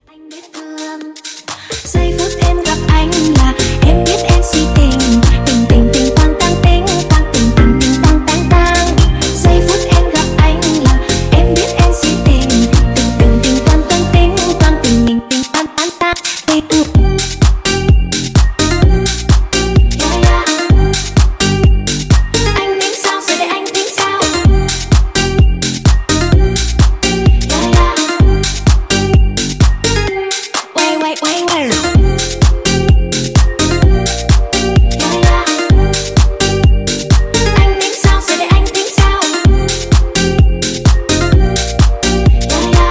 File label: Tiktok Hype Breaker Segue; Remixfreakz